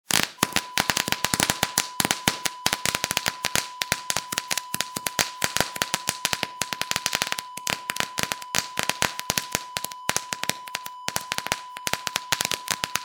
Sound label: firecrackers